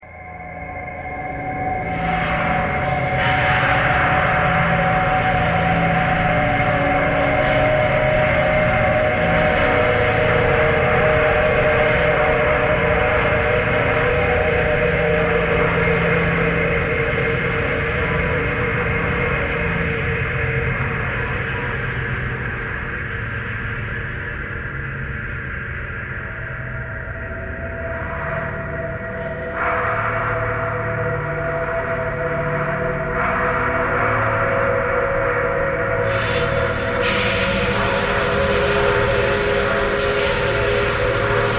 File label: thread harmonic